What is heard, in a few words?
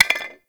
Clay Pot Hit Drop; Gameplay; Awakening Remaster